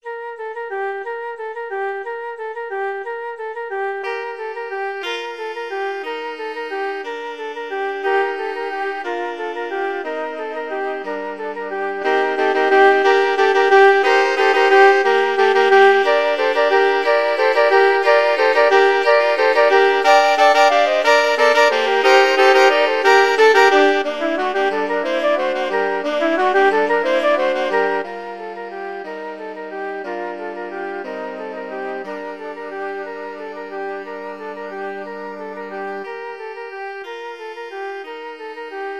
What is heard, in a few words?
flexible ensemble, 4 players